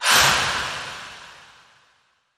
Inhale Exhale Short Breath

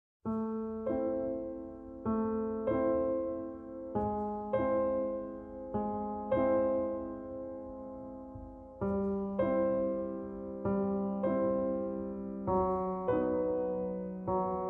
No Oboe

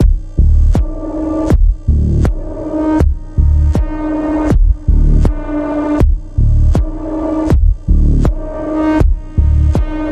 Throbbing Pulse D, Key of D

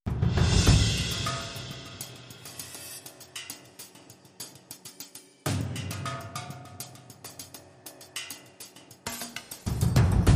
Drums And Percussion

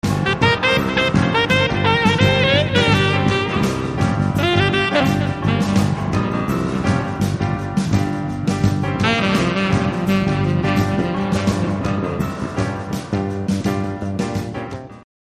Back Porch Blues

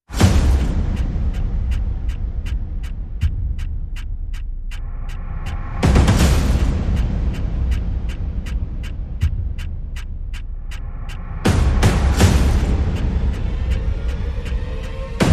Perc and FX

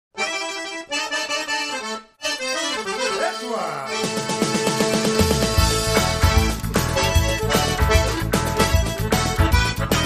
Zydeco Shuffle

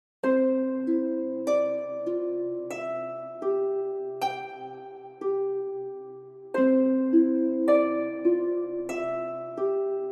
Pads

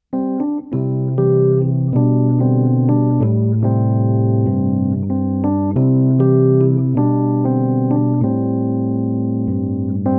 Rhodes

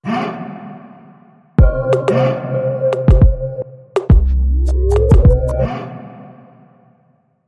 Trap Sting

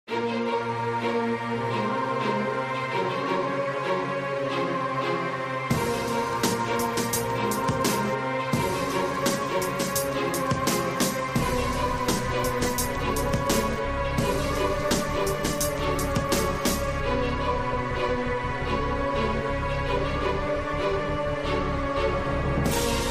Vinyl

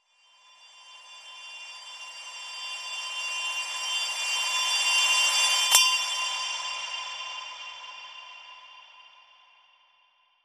Bell Reverse

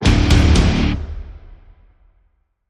Stab Guitar Triple (D)

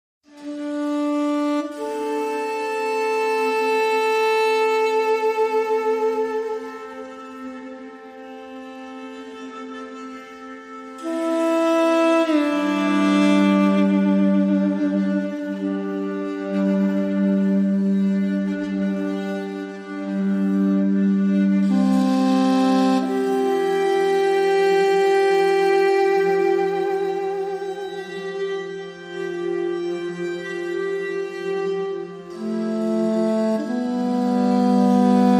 Duduk and Cello

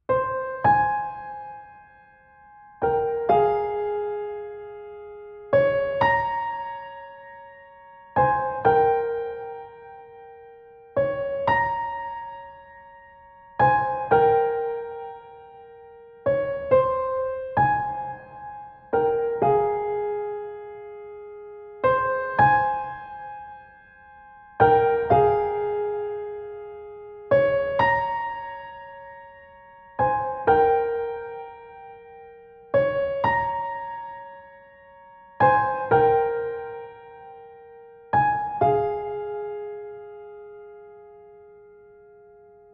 Sad Piano Only Theme

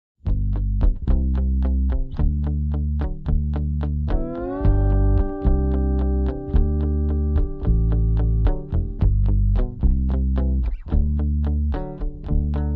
Bass and Electric Guitars Only

